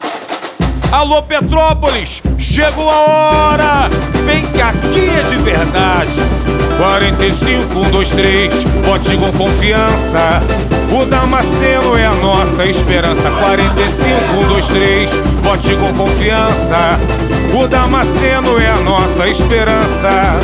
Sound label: jingle